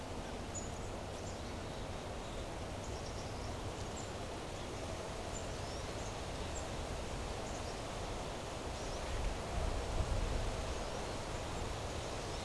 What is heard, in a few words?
Wind In Trees Gentle Gusts; Poetry Project